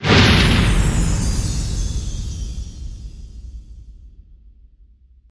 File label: mega Win Text Change; hiddencoinsofegypt